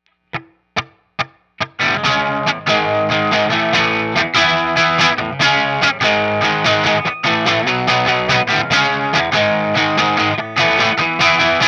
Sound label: TRIWATT P90s Bridge Greenbacks; trinity